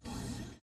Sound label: atlas servo sprint; Titanfall 2